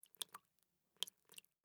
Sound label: Liquid Water Dripping Into Plastic Bucket